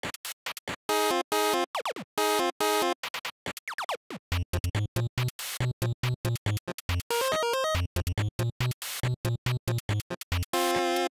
BGM Crane Game; PAPER MARIO Color Splash; wiiu

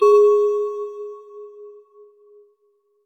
crystal move higher; RPG Maker; Grimm's Hollow

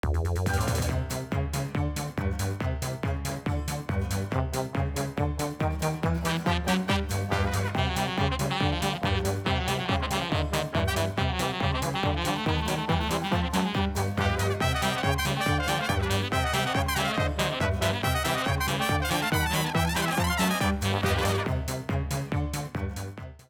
Hammer; PAPER MARIO Color Splash; wiiu

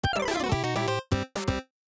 Win; PAPER MARIO Color Splash; wiiu

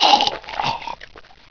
death; RPG Maker; Wartools v1.14

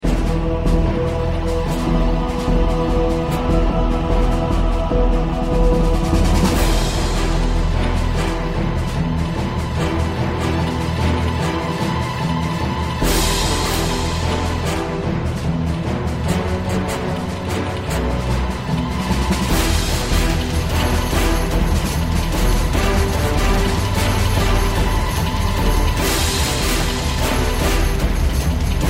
Battle; RPG Maker; Legend of Heroen v1.20

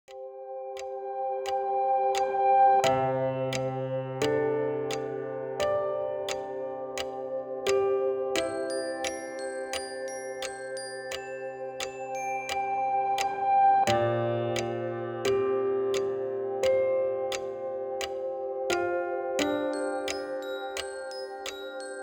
BGM; PAPER MARIO Color Splash; wiiu

